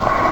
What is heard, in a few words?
Factory noise; gcompris; memory sound